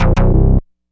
Purple Bass; Dr. Fink's Funk Factory

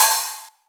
TR909 OHH; Roland SH-32; Drumset 04

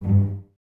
G Pizz, Cellos; String Ensembles; Miroslav Vitous

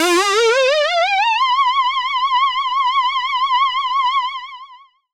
synth c, Dance Synths, Juno; ProSamples vol.37; Juno Jam; Best Service

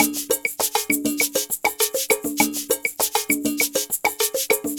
Ensemble, Latin Percussion; Sonic Foundry (Sony Creative Software)

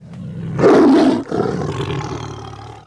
TIGER; Greytsounds VOL-1

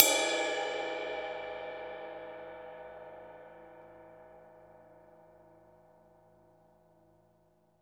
Drum Dry; East Collexion; Drum 1

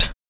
KUK, MALE PERC

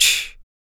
CYMBAL, MALE PERC

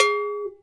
TOTOSAN LO M, Percussion; ILIO; Synclavier Percussion 2; World & Orchestral

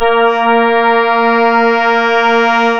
ORGAN, A#; Big Fish Audio; Synclav FM

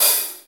HH, Real

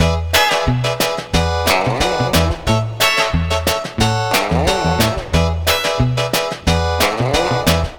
Lounge Full Mix-E; Zero-G Kocktail Kollection; Construction Kitz (Lounge); Welk Lounge 090

